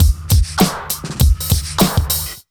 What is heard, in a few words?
100 BEAT, Pure Electro; USB Soundscan vol.03; AKAI